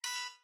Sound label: Turret Button